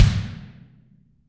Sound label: BD, Real Mega Drums; Best Service; Real Mega Drums VOL-1